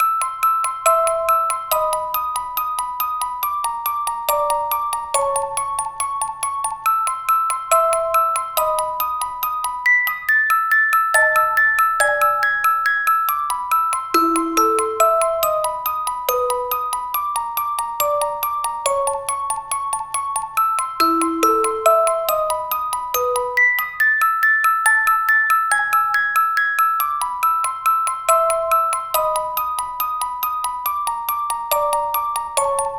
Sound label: Ghost House, Moon, Hurry Up; super mario maker 2; SMB3